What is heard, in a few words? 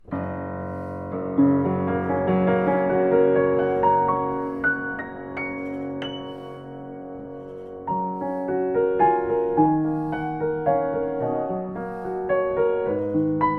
Petrof 131 M1, piano